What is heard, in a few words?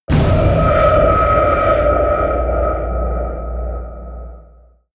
creeper; whooshes rises; hits impacts